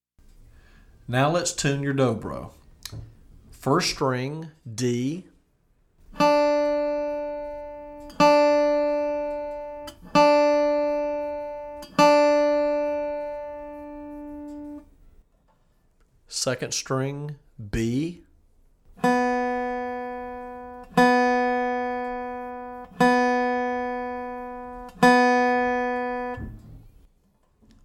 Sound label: Tuning